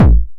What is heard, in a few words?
909 BD Tape Sat